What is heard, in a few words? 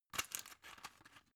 drug blister put in smooth; berufe; arzt